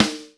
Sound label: Snare Drum